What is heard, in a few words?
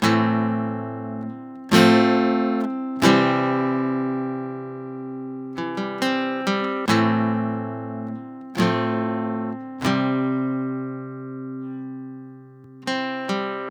Guitar 140 BPM Cmaj; Guitar Loops; Ghosthack; Ghosthack Advent Calendar 2021